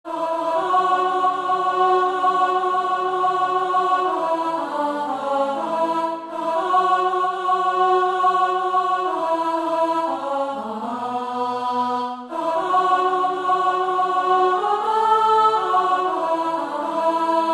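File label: Verse Chorus Soprano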